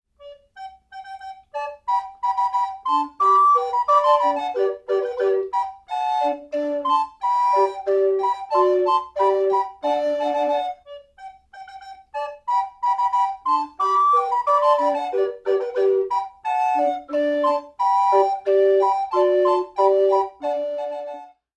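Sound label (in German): Blockflötenquartett Aufzugsmusik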